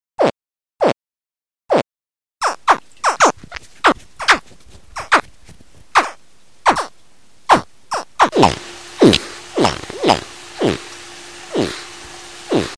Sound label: alligator distress